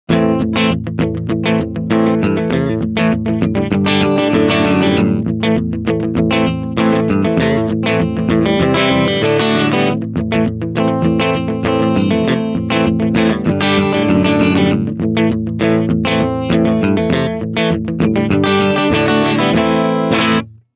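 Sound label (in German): Clean picking beide Singlecoil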